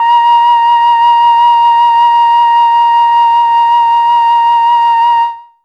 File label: flute; ILIO; Propeller Island Legendary M400